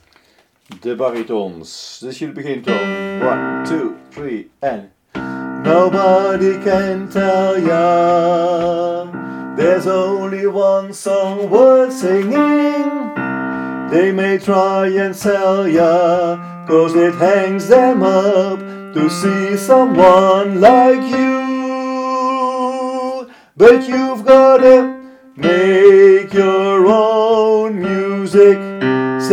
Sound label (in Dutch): bariton; oefenfiles